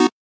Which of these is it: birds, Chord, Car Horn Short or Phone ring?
Chord